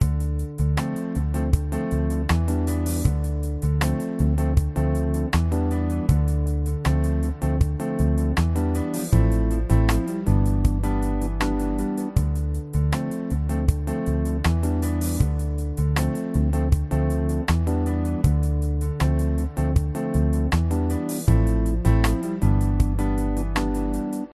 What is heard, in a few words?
smooth jazz piano soft